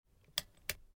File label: sms